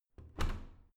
washing machine modern close soft

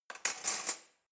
cutlery knife drawer rattle

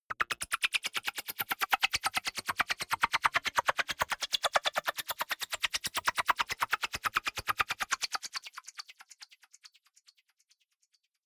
delay gater

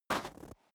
step snow single right

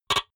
typewriter single

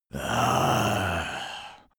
zombie moan idle throat

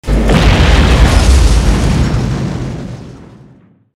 war zone big explosion

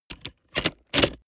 plastic latch full

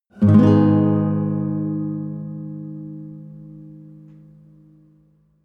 nylon guitar chord full major soft

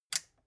metal button push power off

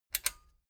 metal button stereo click disable fast

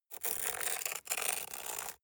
scrape stone

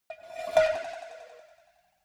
cowbell scratch hit move soft

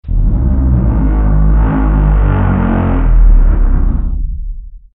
moaning elements

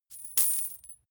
coins drop soft

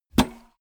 bmx bike grab bar heavy

